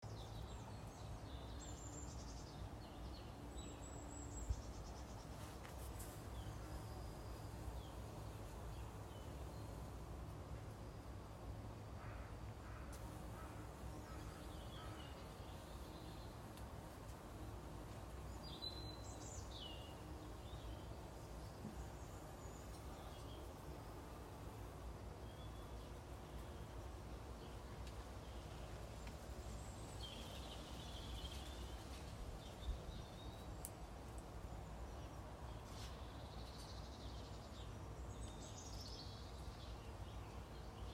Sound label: Peaceful lockdown sound in Springfield; CM1-6HX